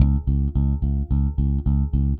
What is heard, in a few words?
Eighth Notes C#, Bass; Bass X